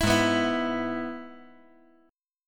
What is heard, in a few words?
Ab Minor Sharp 5th chord; strummed; 9,8,7,7; 5G,4C,5E,5A